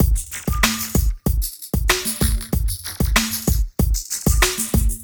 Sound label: Club Tip, R&B Drums